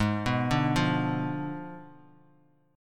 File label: Ab Diminished 7th Ab, Bass Standard; Abdim7 arpeggiated; JGuitar